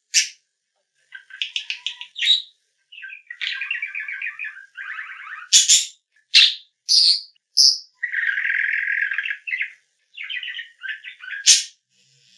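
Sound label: volnistyj popugaj golos, budgerigar voice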